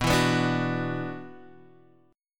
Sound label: E 7th Suspended 2nd, B, chord